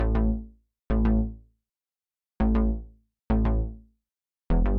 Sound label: BASSLINE; Vostok Inc.